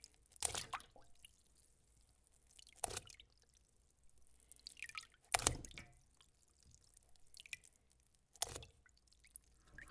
Water Splash

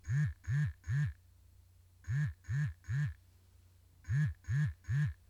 Vibrating Alert

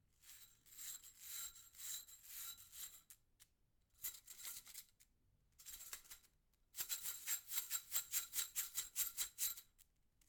Slinky

